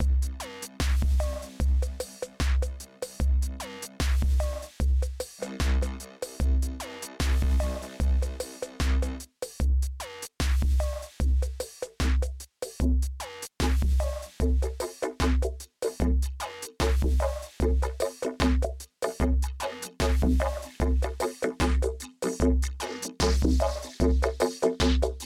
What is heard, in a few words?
vocoder drums water drums